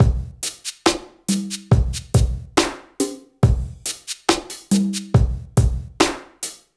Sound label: Slomo Beat 140 BPM, Loops, Slow Motion Beats